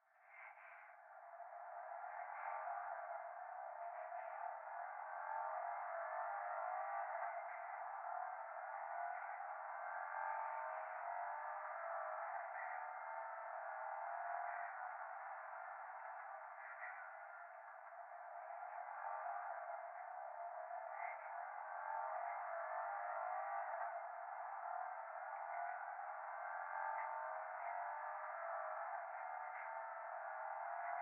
風呂 左耳密着吐息 車の中

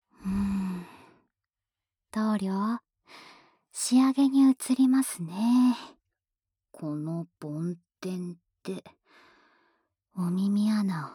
【左】梵天, ASMR

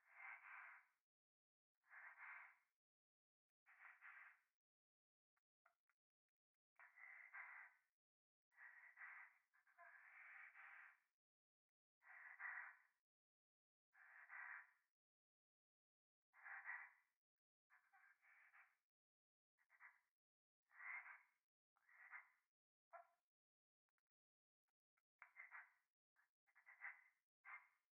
風呂 左耳密着吐息

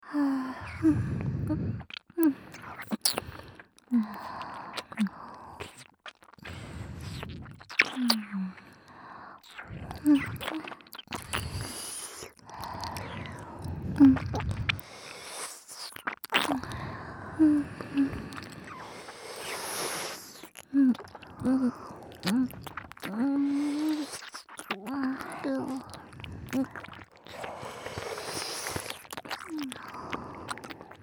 脳バグ まったり MIX 両耳, ASMR

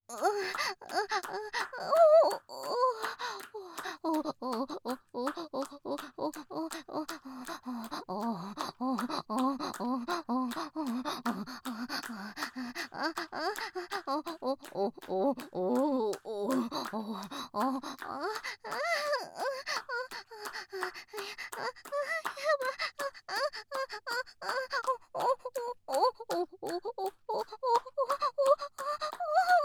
オホ声; SEあり

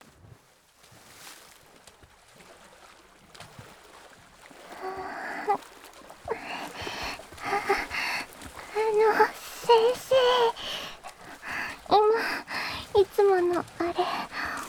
喘ぐ, ASMR; 男の人でも、乳首であんあん喘ぐんですね♪（スク水で乳首責め）

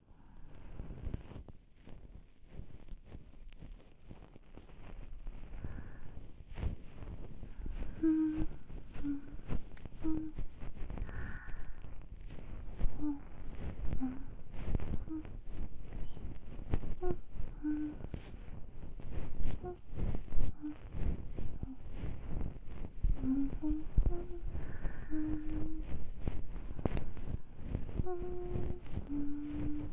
両耳梵天のみ

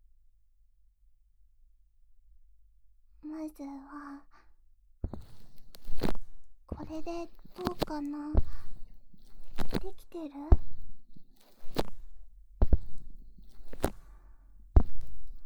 ハンドマッサージ

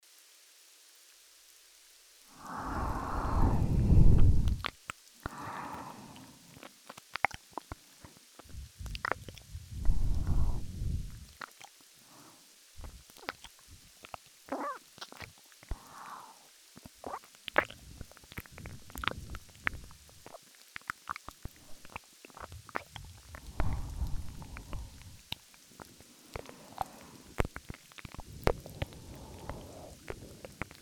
耳舐め, ASMR; 背徳の温もりと耳舐め

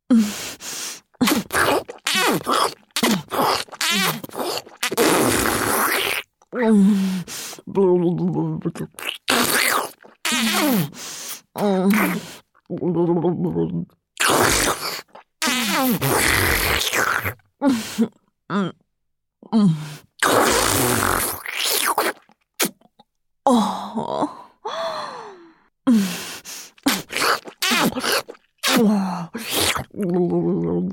バキュームフェラ; メインキャラ

